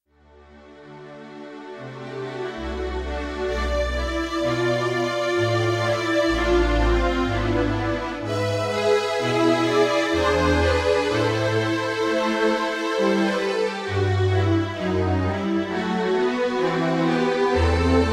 Strings

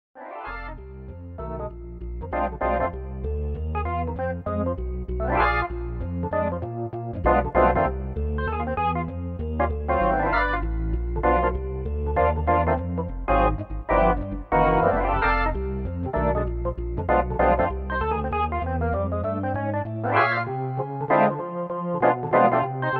Organ

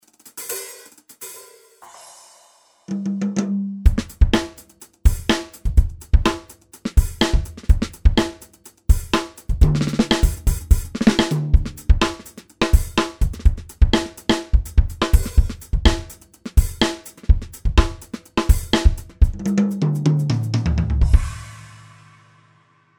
Strike Hard Funk

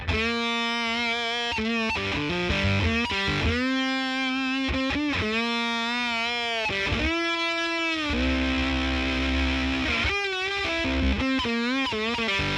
slide

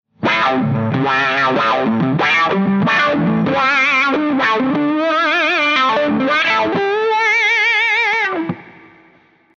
Mega Wail; GFX KILOWAH

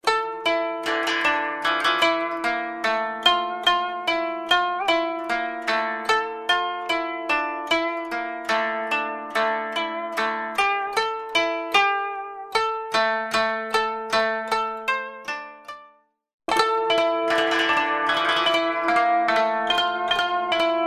Koto

